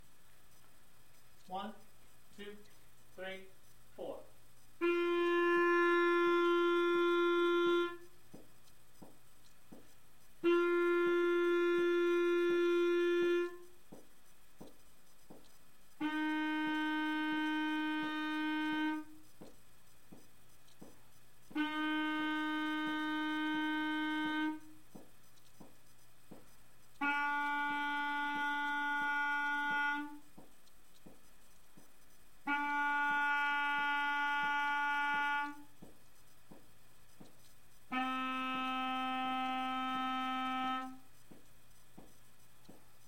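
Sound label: clarinet 1st 5 steps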